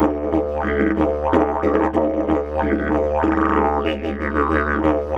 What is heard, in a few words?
Didge 93 BPM, Didgeridoo